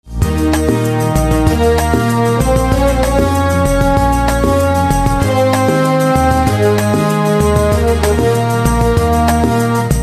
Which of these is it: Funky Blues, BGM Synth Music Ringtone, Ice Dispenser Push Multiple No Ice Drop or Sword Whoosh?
BGM Synth Music Ringtone